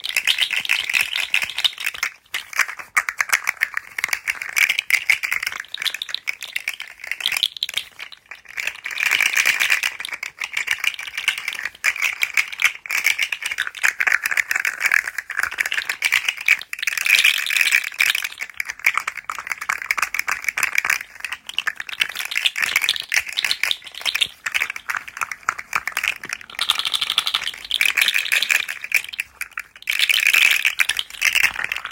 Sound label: fidget slug; Golden Crayon Sounds